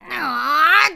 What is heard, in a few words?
Lift Heavy; Link lifting a heavy object; zelda